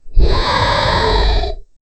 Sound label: a deep dragon roar that